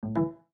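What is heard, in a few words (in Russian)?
звук Windows 7 устройство вставлено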